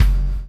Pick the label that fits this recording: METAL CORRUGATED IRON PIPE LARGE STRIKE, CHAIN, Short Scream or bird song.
CHAIN